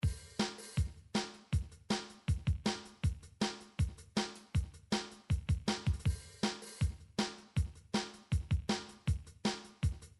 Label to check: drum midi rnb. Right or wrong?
Right